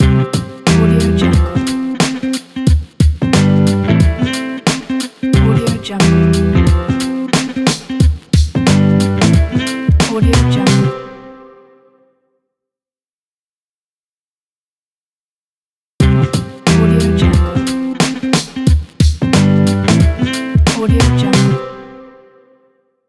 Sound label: positive logo; amoebacrew